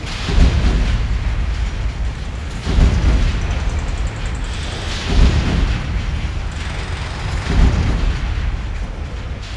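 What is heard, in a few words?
outdoor loop; Garry's Mod; tf2; cp harbor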